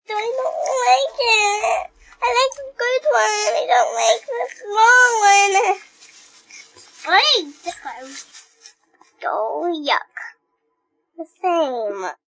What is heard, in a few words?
Kids Whining, Male, child, whine; Talking